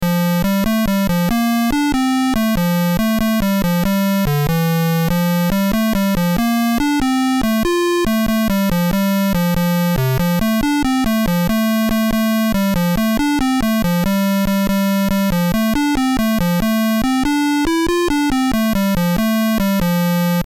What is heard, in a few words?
Bagpipes (Player Piano); BASIC Manual